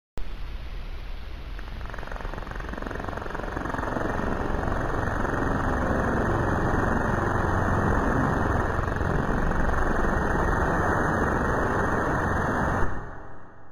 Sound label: Signal